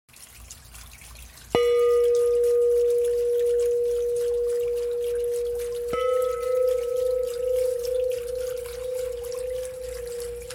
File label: Chimes; Dan Coyne